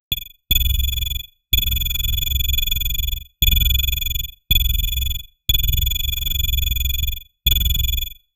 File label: futuristic sci fi sound, robotic